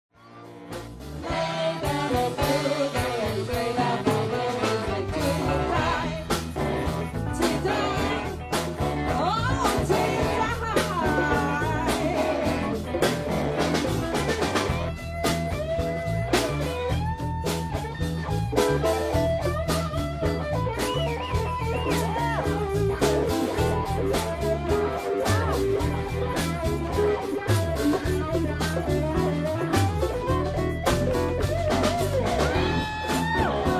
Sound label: funky